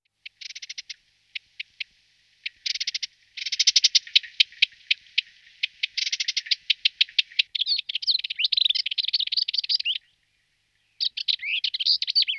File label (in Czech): Penice turecka Sylvia ruppeli